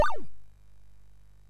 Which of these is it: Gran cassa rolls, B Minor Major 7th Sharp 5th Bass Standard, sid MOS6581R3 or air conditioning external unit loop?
sid MOS6581R3